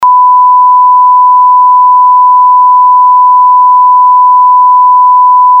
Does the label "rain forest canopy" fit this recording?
No